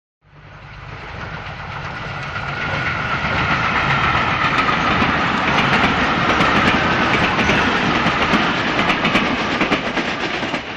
Train By (Fast) Electric Train Short Approach Fast Past; Hollywood Edge 3-D SFx